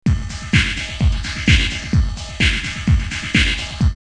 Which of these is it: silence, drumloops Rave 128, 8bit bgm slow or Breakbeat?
drumloops Rave 128